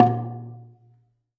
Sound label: Gambang Soft Beater, Gamelan